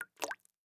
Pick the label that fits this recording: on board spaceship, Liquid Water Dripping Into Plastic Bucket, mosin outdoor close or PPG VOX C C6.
Liquid Water Dripping Into Plastic Bucket